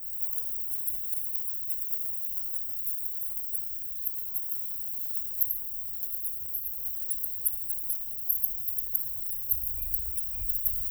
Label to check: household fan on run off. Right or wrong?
Wrong